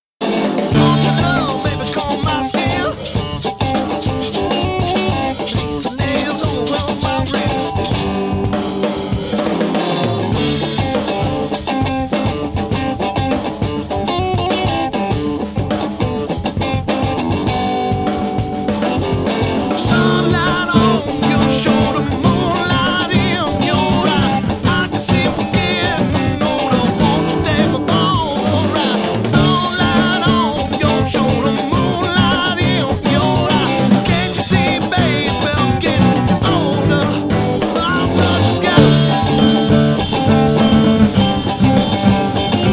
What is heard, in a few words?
Bass